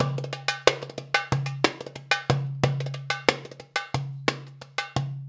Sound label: PERC LP, Arabian Traditions